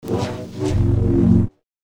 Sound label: Double Bladed Saber Sound; FXHome